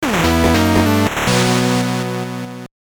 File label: Jingle; Digital Monster Ver. WonderSwan